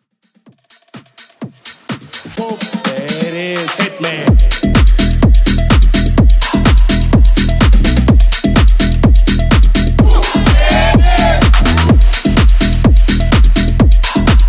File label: Acapella Drop